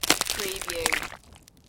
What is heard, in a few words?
Snow and Ice, Ice stretching with water underneath